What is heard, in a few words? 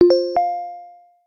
Metallic; Samsung Gear S; Alerts